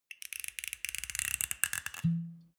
bottle whisky cork pull out creak long, gastronomie; berufe